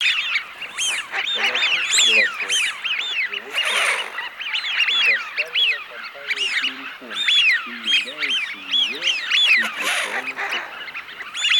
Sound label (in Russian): Свиязь Fischione; plurifon